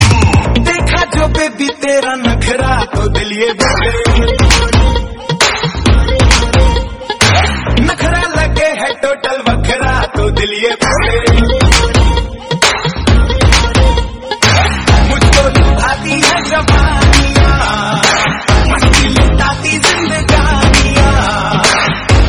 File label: Ole ole 2.0 ringtone